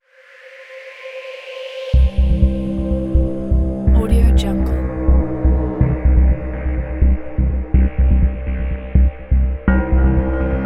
the suspenses

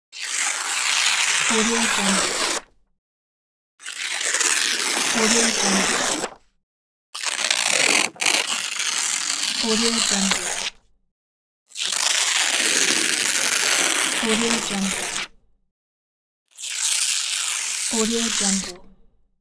paper tear long

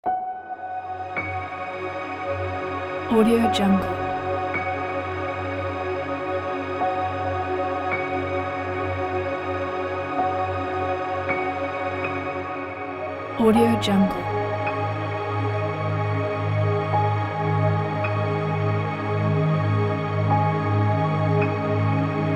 documentary soothing soundscape meditation

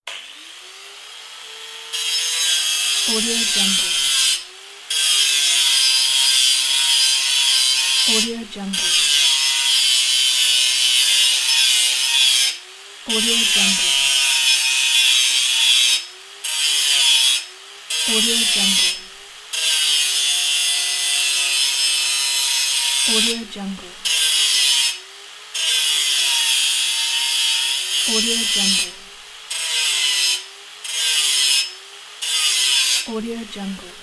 angle grinder

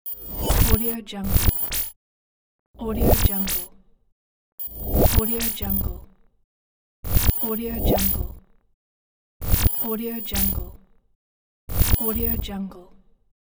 high tech transition; kaelv

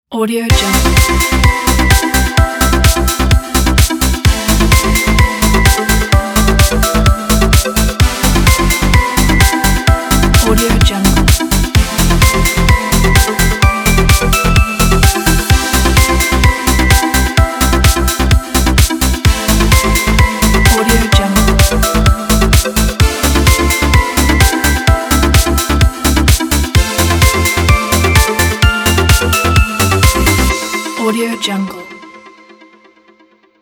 happy dance; boxbeats

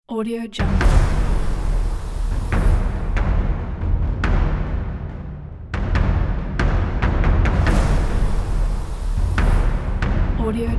epic trailer percussion tool

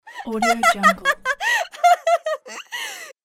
young girl loud laughter

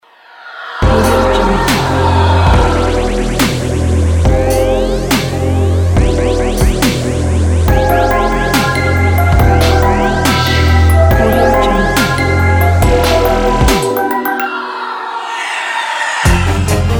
dark halloween dubstep

